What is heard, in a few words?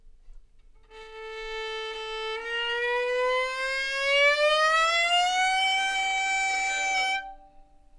violin Frustration